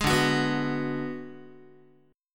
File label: D 6th Flat 5th D down Guitar, chord; D6b5 strummed; 11,10,9,9,10,x; JGuitar